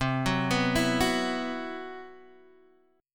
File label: C Major 7th Flat 5th C, Guitar Standard; CM7b5 arpeggiated; x,3,4,4,5,2; JGuitar